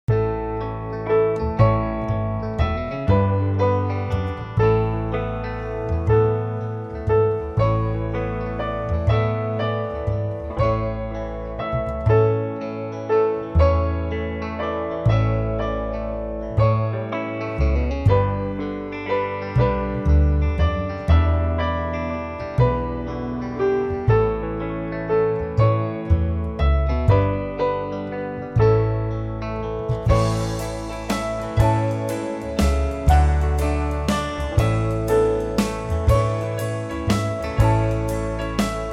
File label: backing track; Oran no Maighdinn Mhara